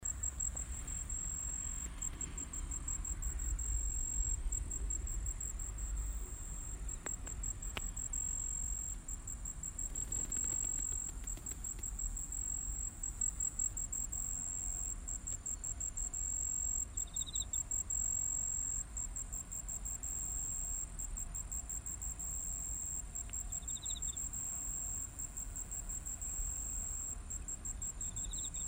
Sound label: crickets